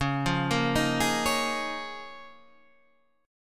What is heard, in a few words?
A sharp Minor 11th